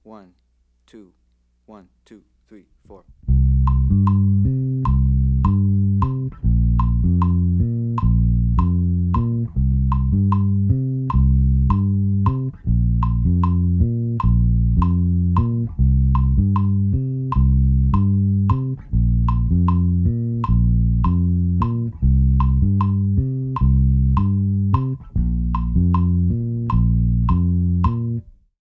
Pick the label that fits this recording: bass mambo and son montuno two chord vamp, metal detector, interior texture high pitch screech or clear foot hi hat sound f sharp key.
bass mambo and son montuno two chord vamp